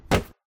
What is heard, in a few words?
Hit; HuggyWugg